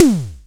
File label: SNARE, Simmons SDS5